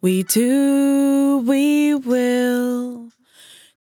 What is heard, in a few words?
100bpm Bm, Velvet Vocal; Zero-G